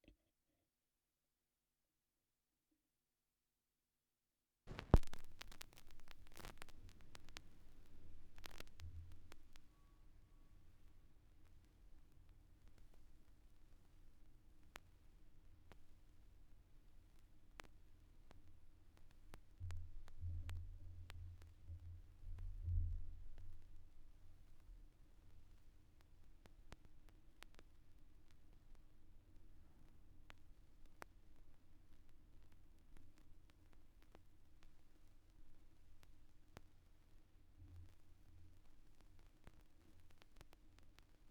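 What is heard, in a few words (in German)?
schallplattengeraeusche, record noise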